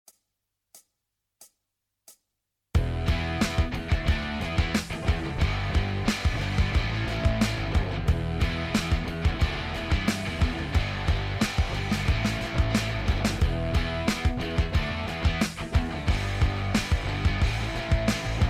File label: 70s Disco Blues, eminor